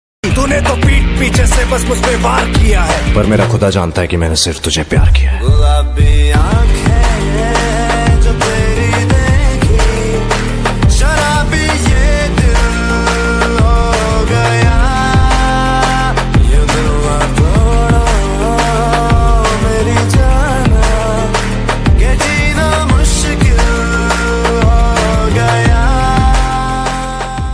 bgm ringtone attitude ringtone

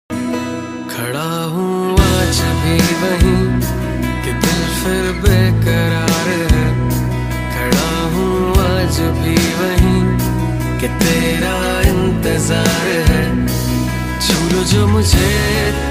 song

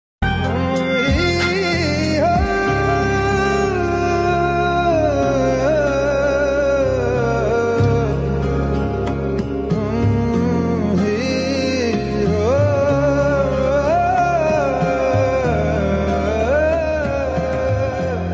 sad ringtone

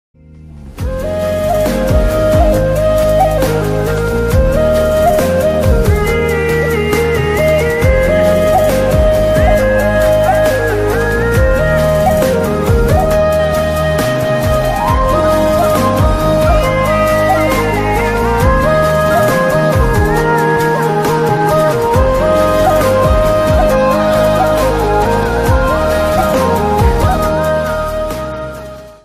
flute theme ringtone; love aaj kal 2

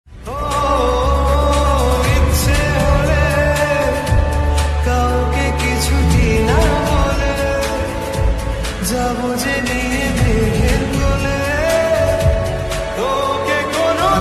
bangla song slowed reverb bangla ringtone; bangla gaan ringtone

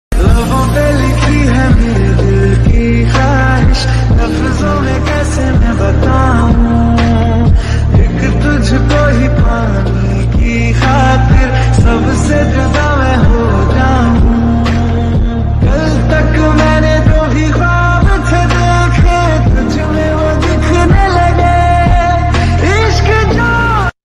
turkish ringtone instrumental ringtone

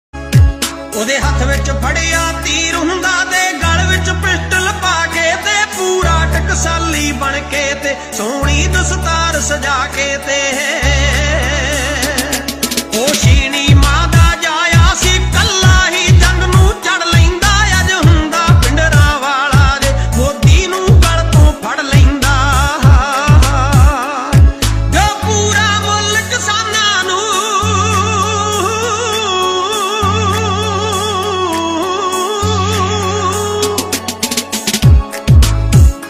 ringtone song punjabi

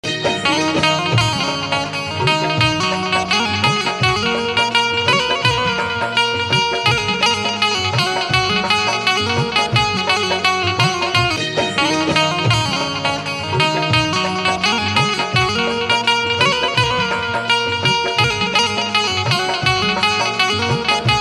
banjo ringtone bhakti

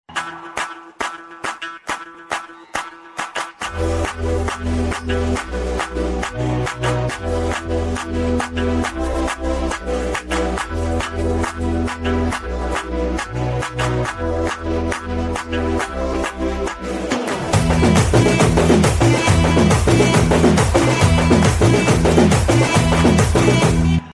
song bgm ringtone; pushpa 2

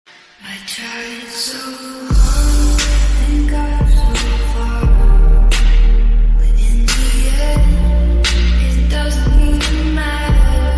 ringtone 8d remix lofi remix

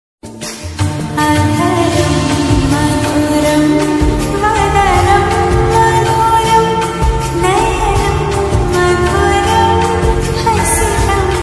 adharam madhuram ringtone lofi ringtone

